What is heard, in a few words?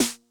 Snare Accent High Tuning Mid Tone Max Snap, 909; classic machines